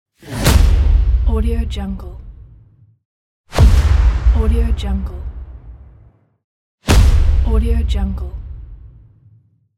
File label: cannon